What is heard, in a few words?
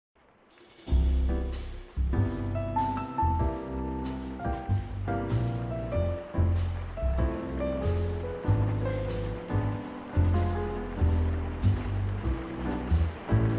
Jazz Cafe Mix (w⧸ Cafe & Rain Ambience); Persona 5